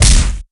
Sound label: Enemy Take Hit; Skylanders Cloud Patrol; Arcade, iOS, Mobile